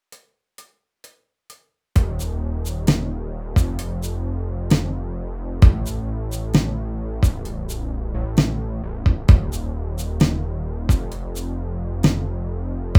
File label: Rhythm Column Backing Track